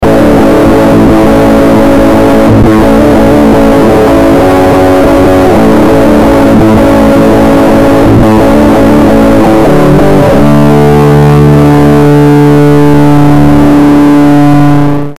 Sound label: fuzz